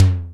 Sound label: Tom Tone; EMU Xtreme-Lead-1